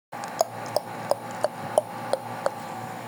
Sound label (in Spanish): sonido raro